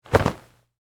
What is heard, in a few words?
Pillow Fluff Hit, Foley, Pillow, Fluff, Hit, Impact; Bathroom; Household; BlastWave